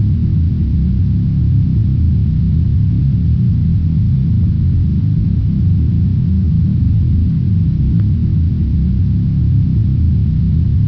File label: plat move; moonwalker